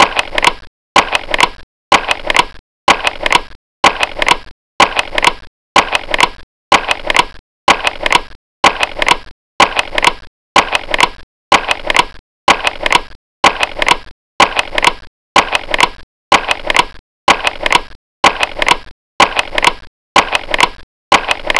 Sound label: lockpick